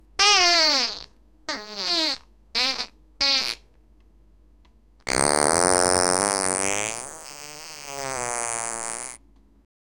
toy animatronic, toy

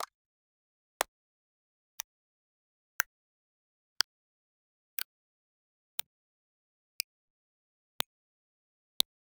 menu navigation sounds

